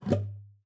GUITAR FX; Xsample VOL-2

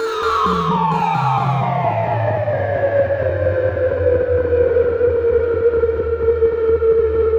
Ugly, Industrial, Nasty

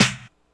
snare, Hardcore Breakbeat; Big Fish Audio; Hardcore Breakbeat Action

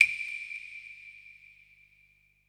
SIERRA PER; Best Service Advanced Media Trax Building Blocks

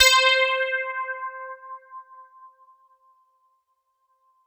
SY99 EP; KEYS; Alpine Volksmusik

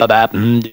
BE BAR HUM; AMG

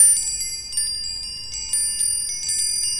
Rolling Rod Loop

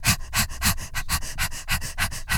HYPERVENT; Spectrasonics; Vocal Planet; Blues Soul

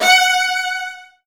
Virtuoso Strings

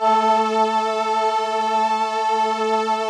VP-330, String Machines

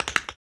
TAP, Human Perc, Body Perc; Spectrasonics; Supreme Beats; World Dance

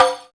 Rek Rim; Roland BK-5; Percussion

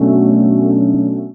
Chords Tones; Equipped Music; Loungin House

